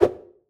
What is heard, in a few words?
jump